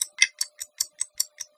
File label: tik tak; hunt